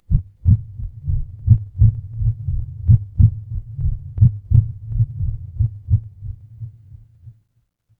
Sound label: horror heartbeat